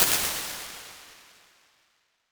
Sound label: parking garage